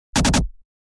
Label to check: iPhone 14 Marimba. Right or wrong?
Wrong